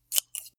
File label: scissors open close